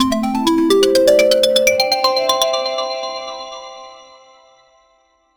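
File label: a fun and upbeat notification; streak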